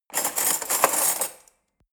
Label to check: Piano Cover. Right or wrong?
Wrong